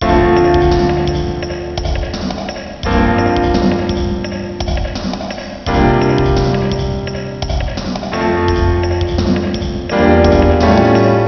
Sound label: lap